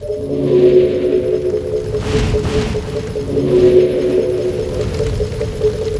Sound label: Ice Worker Idle